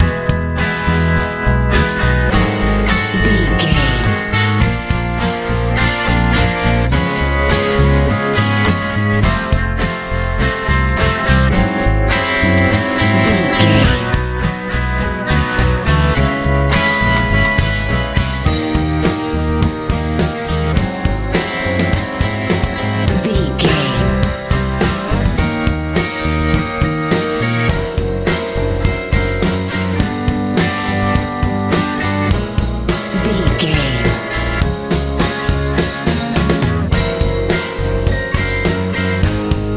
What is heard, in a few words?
med rock